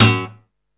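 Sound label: GUI PULL, acoustic guitar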